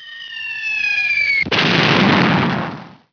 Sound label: BOMBAWAY; VALUPACK